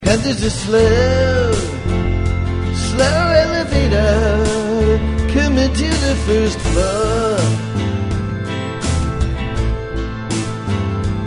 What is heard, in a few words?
slow elevator